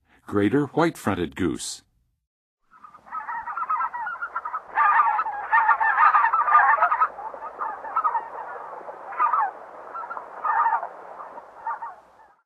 Greater White-fronted Goose; N. America; Songs of Birds of North America; Western USA Songbirds

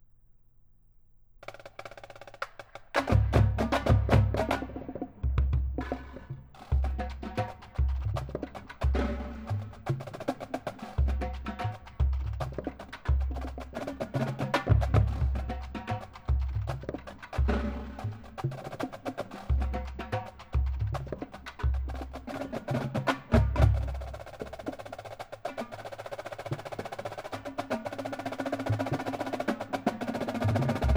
Street Beat, Drums; Drum & Bugle Corps; The Troopers Drum & Bugle Corps; The Blue Knights Drum & Bugle Corps

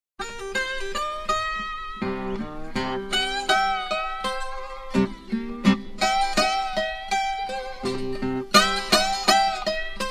Slide Guitar; Jazz & Rhythm & Blues

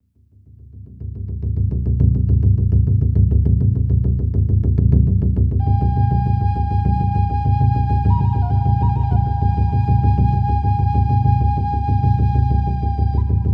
Flute Prayer; Native Sounds; New Age

